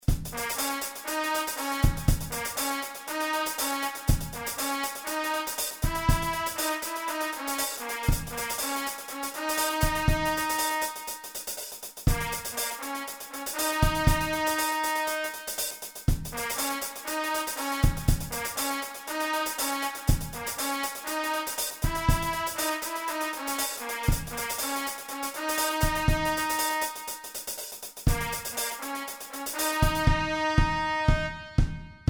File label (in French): Trompette Batterie